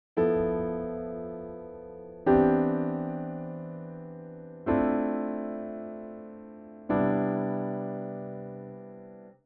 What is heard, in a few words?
notes mixed